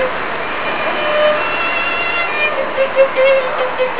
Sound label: street fiddler